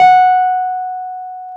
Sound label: PNO, Wurly; Roland LCDP10 Keys of the 60s and 70s 2